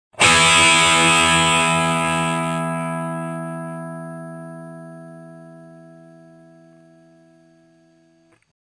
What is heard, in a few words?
guitar